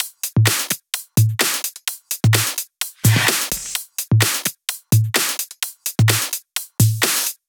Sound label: 128BPM Drums; Vengeance Samples; Economic Kit